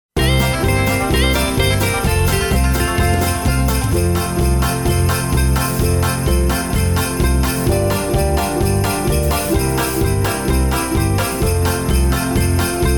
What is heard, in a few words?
with bells rhythm track